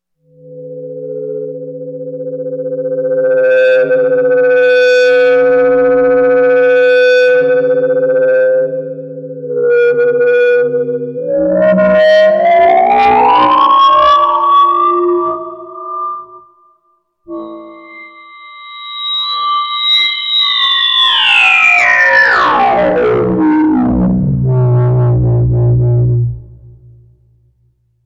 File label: feedback